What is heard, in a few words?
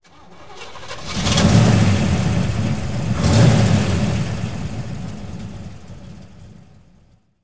1959 corvette rev engine; Car SFX